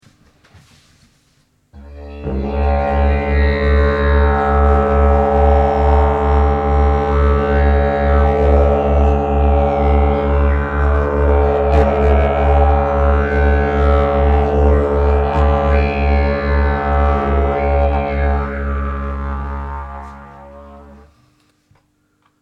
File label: Binaural Didjeridoo RE